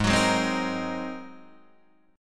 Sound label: G sharp 9th, Guitar, Open D